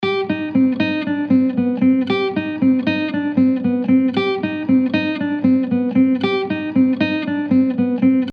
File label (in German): Gitarre Picking Git Amp Pro